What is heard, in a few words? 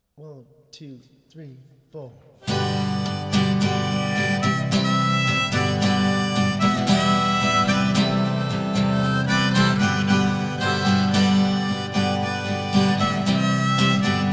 Acoustic